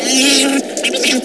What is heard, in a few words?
TOM-TOM; STARS FXS; East West; Global Sounds